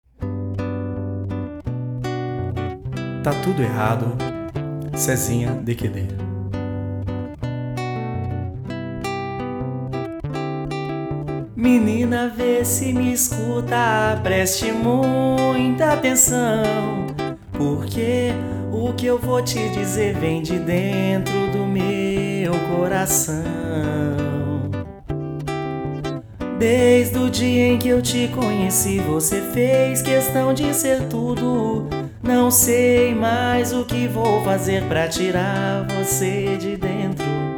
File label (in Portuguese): violão voz